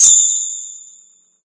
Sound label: Ice; Mesukemo-Hime-no-Kaisuiyoku